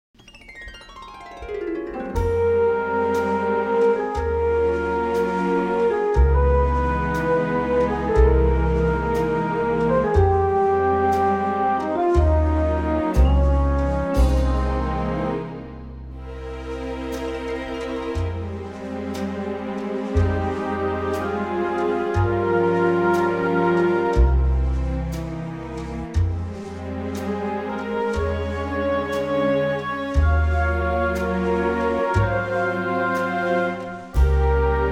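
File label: Fm Orchestral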